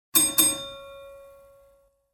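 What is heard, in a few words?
ding ding, bus bell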